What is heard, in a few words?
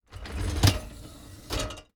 Dishwasher Top Draw Open With Dishes; household